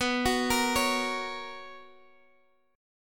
B Major 7th Suspended 2nd B

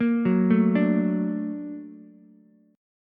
Bb Augmented Major 7th Bb down Ukulele; Bb+M7 arpeggiated; JGuitar

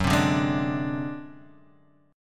A flat 7th Flat 5th G flat, chord

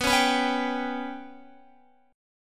B Suspended 2nd Sharp 5th down Guitar; Bsus2#5 strummed; JGuitar

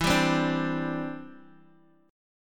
E Minor 7th Sharp 5th, down, Guitar, Open D

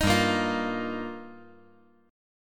G Minor 7th Sharp 5th

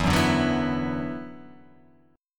E 7th Suspended 2nd E down, guitar

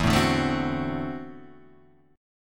D 9th E, guitar; strummed; JGuitar

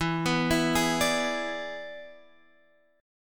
E 7th E down Guitar Drop D

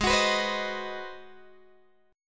G sharp 7th Sharp 9th, Guitar, Open G

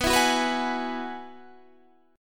G sharp Minor 7th Sharp 5th, chord

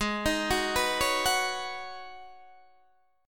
G sharp Minor 11th G sharp Guitar DADGAD, chord

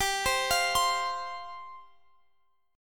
C Suspended 4th G

